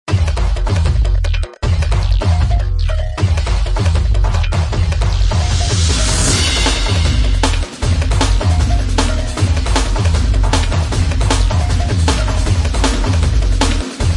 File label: Drums Only Version